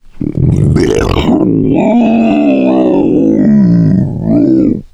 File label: male boomer lurk; cstrike; l4d2